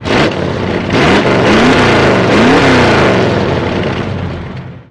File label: Sports Car Growl, horns; Trackmania